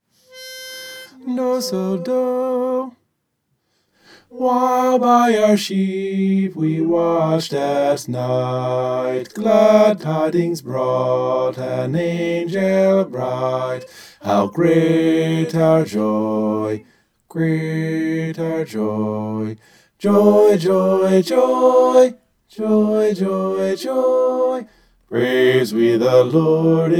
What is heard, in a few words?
bass